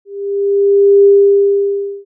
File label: quant noise